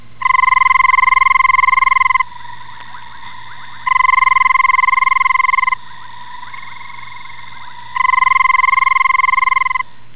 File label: red-banded rubber frog